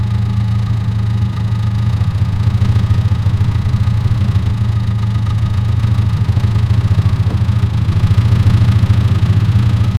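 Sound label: car engine ignition; automotive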